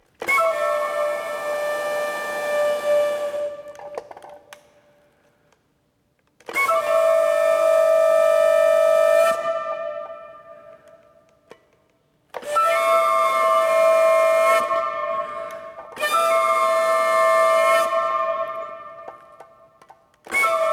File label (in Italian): organo